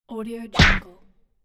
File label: fast punch